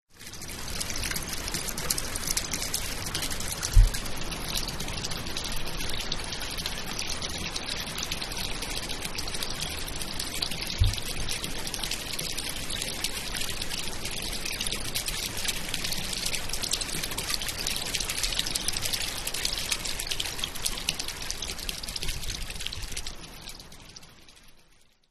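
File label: stream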